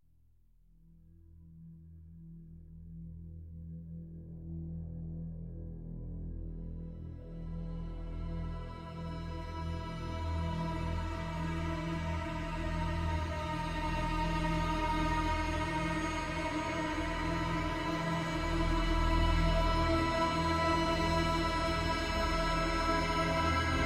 Ambient Pad

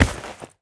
land carpet

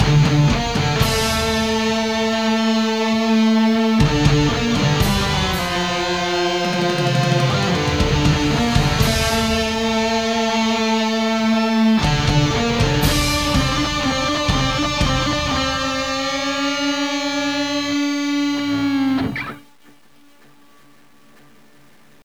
Start Music; 10 Second Ninja X

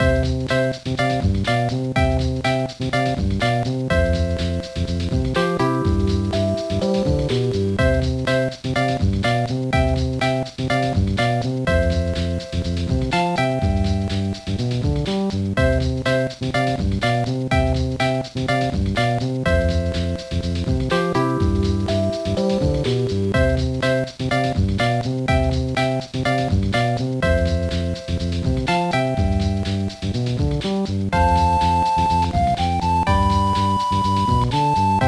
BGM; fancy pocket; gba

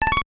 Counting Hits; Duck Hunt

